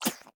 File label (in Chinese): 狐狸吐口水声